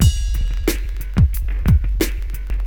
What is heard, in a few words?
DM LOOP, Hip Hop, Drums; LiveAct Pool